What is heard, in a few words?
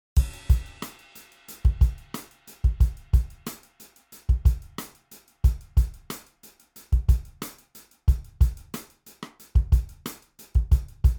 drums sans; omnitec